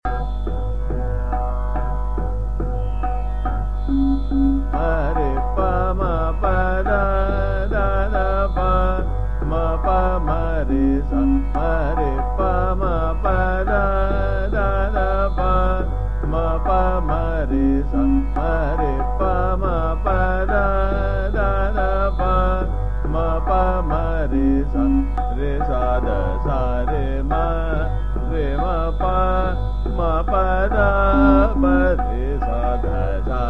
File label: Sargam, Ma Re Pa Ma Pa Da, Trital; Shobhawari